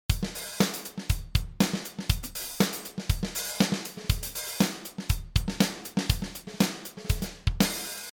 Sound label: groove